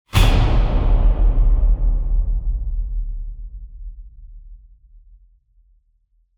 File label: Distorted Strike; Gothic Storm Music